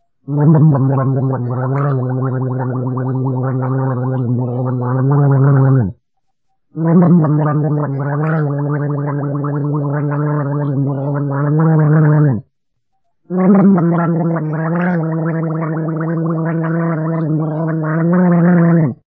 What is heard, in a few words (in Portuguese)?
garga, som de gargarejo